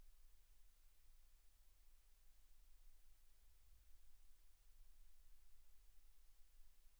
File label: silence 48kHz